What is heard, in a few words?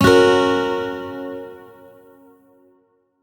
Shawzin Yo Chord I; Music; Warframe; Lotus Shawzin